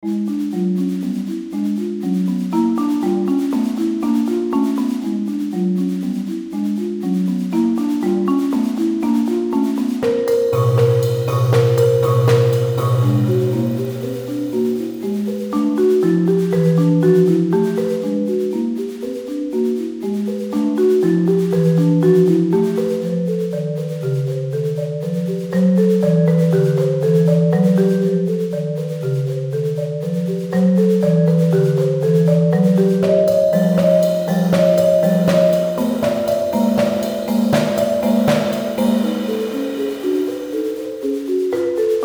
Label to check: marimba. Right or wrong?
Right